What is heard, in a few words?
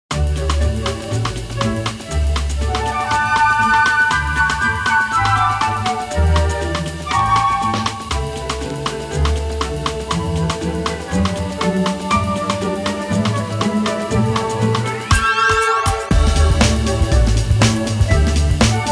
Bossa Reed Dance